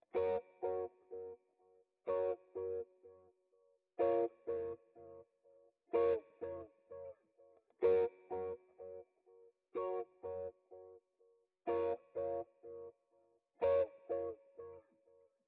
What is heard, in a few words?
125 BPM A# Min Guitar Strike; Melody; Loop Stems; Cymatics; 2022 Melody Collection; Wavy; Power